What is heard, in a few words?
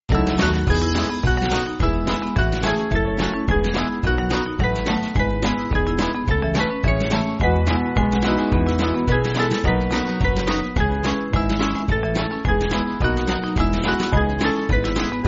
It Came Upon, band; Noel